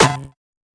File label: tight hit; STYLE KITS (Hip Hop, Etc)